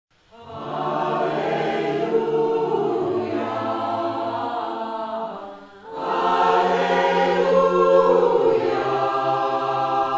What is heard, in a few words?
alleluya